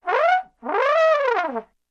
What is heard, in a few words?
Trombone Wolf Whistle